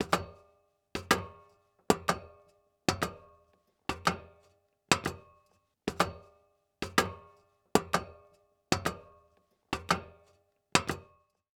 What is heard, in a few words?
Foley Footstep Hard Sole Dress Shoe Walking On Metal Platform; Foley Footsteps